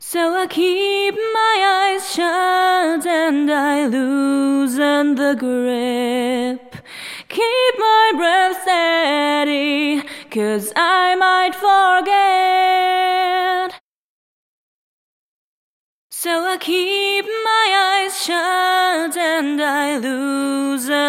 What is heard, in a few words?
voc eq